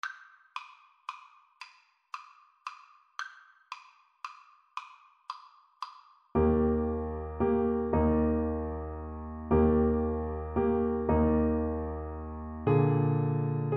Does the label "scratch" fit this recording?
No